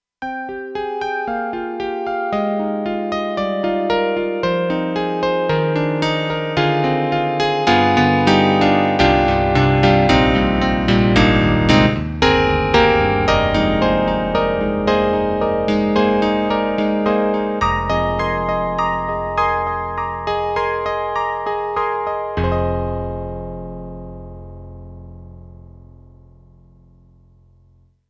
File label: Piano; Roland; SC-8850